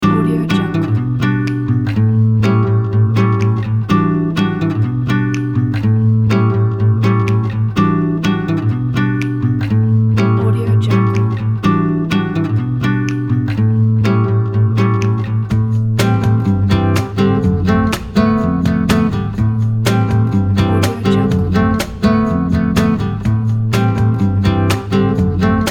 food relaxing guitar

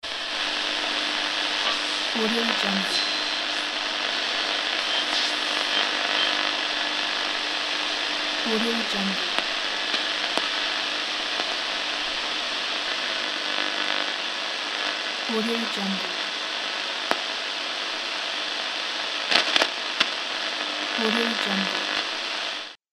static

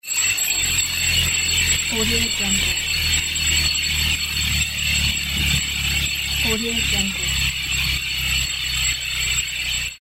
wavy rotation

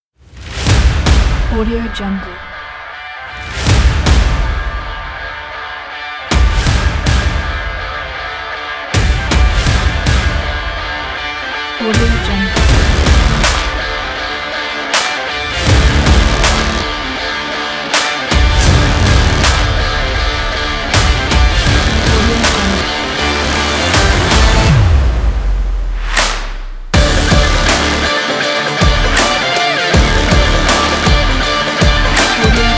racing kit

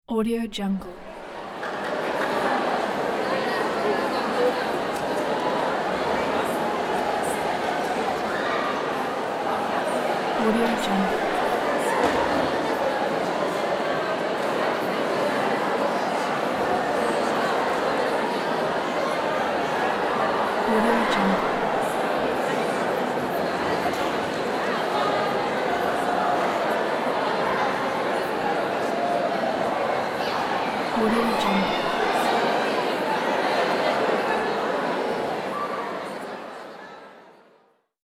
restaurant ambience

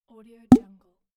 bloop tube low

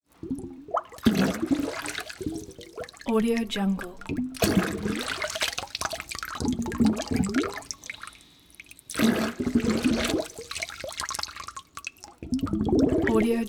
underwater bubbles bursts

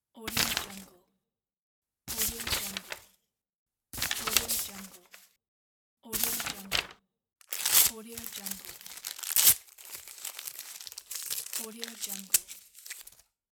papers

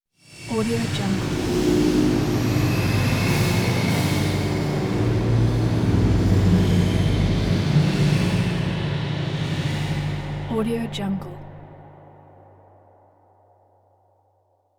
scary deep airy movement; hollywoodedge

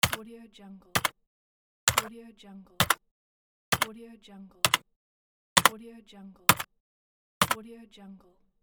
typing pack

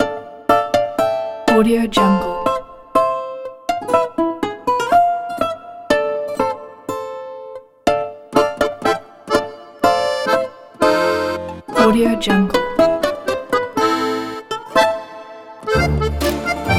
russian balalaika folk dance

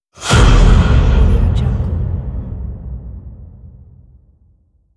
new year hit

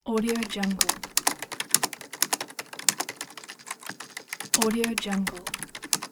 keyboard typing

